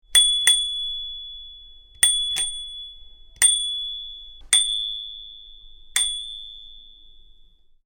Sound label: Bike Bell, Bicycle Bell - single ting various; Miscellaneous Impact; Tom Hutchings